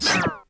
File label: Computer - Shut Down; Ed Edd n Eddy; Adromulus Pack; EEnE